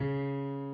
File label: key